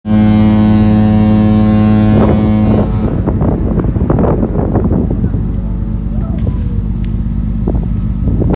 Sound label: Horn; MS Color Magic